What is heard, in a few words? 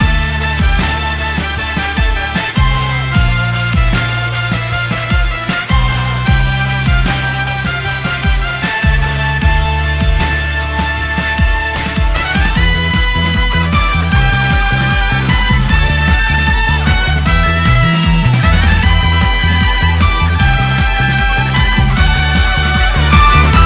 Bass Boosted Brass